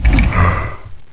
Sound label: step